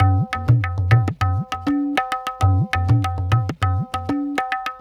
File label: Tabla